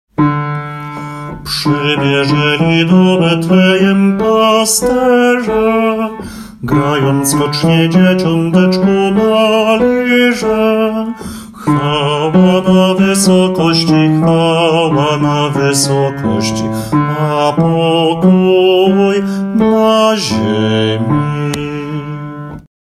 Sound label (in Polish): Przybiezeli do Betlejem BAS